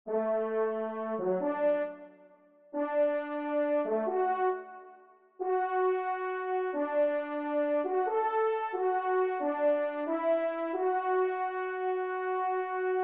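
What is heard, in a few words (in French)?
trompes, ensemble; Le Rendez-Vous de Chasse; Rossini; Schickfer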